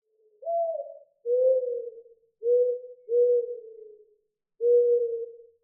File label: dove